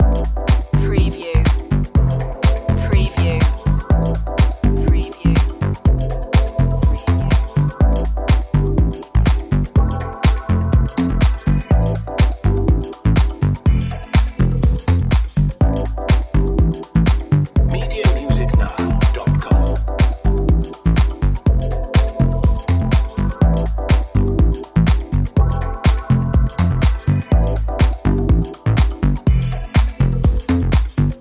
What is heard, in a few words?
funky loop